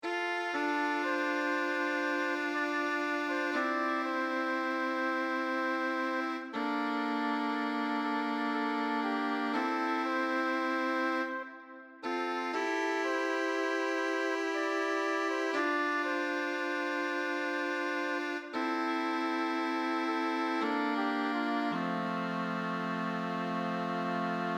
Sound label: chords